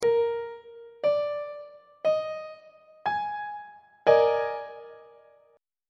d sharp major 7th suspended 4th 2nd inversion on treble clef